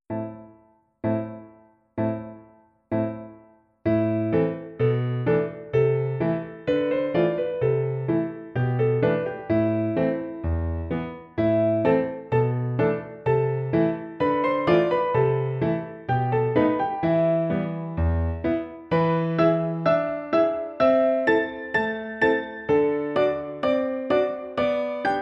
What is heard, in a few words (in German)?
Arabisches Lied